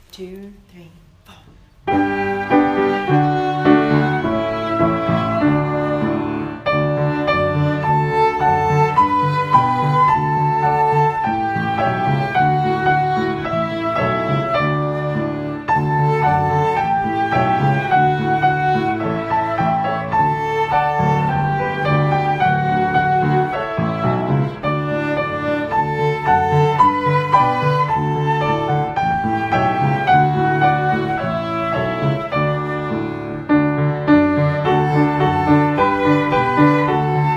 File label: Twinkle, practice backing tracks